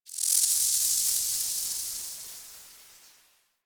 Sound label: Tengu tornado end; Warframe